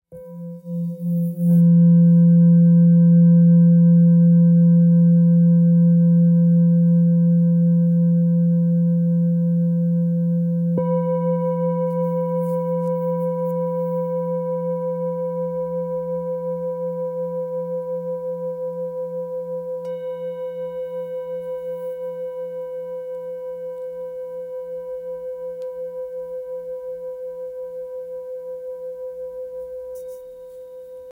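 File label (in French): bol full moon fa, bols chantants tibétains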